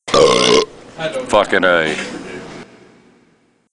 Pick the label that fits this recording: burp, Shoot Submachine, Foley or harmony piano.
burp